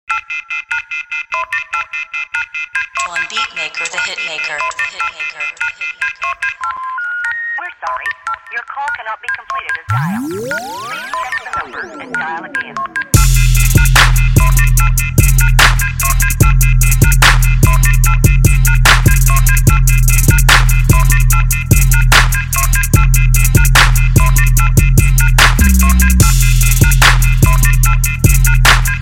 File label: Dial Tone Instru